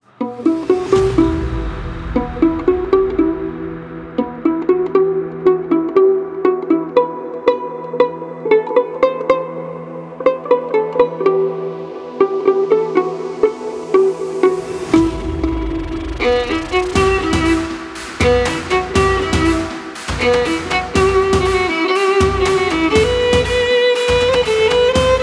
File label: Violin Cover; Bella Ciao